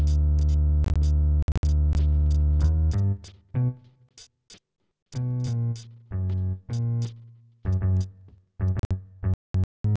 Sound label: wdrums inst sim; SASSEC07